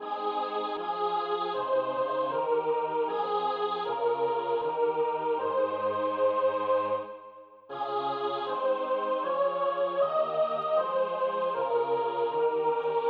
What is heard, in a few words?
Sopr.; C.H.Graun; Der Tod Jesu